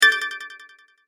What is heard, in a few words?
Short Multimedia Alert Effect, Chime; Chime Sound Effects; Chimes (Sound Effects:Multimedia); Stuart Duffield